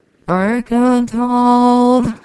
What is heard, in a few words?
singing